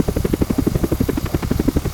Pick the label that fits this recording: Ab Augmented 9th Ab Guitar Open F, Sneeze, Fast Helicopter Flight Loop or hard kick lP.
Fast Helicopter Flight Loop